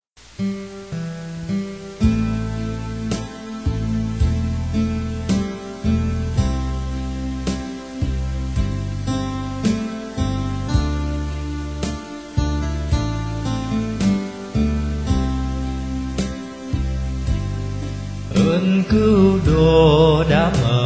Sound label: tenor